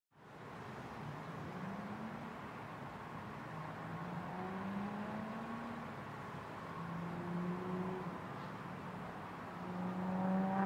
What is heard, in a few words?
parking lot